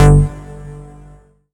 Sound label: Synth Cuts; Vengeance Samples; VES1 Instruments